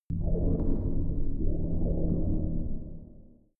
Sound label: space alien ship water pipe; raumschiff